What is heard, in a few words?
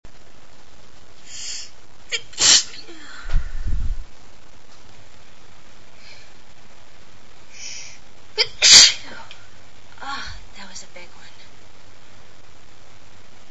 hurricane sneezes, female